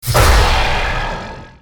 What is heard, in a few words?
teleportation pickup